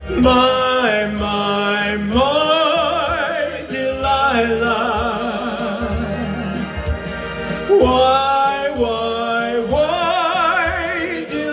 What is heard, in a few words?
Professional Singer